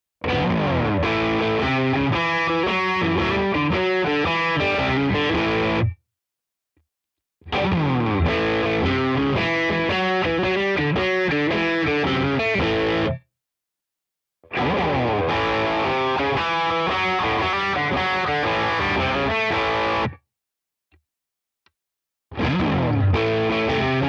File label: Schecter Exotic Ziricote crunch Blues Riff all positions